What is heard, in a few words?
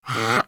Puppy Idle Jump; Warframe; Kubrow